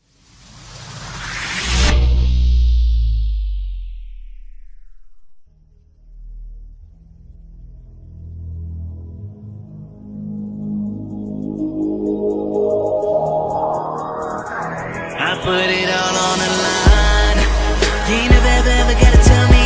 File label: instrumental with hook, hip hop beats rap beats